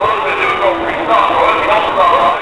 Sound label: Speaker; Scenery; Decors